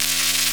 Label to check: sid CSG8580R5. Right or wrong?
Wrong